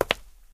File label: concrete step; Golden Crayon Sounds